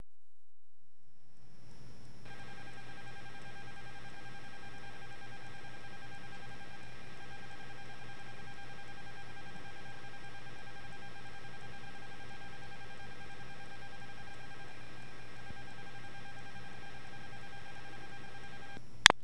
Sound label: high pitch, Bluetooth Speaker; Modsynergy; DGZHE A06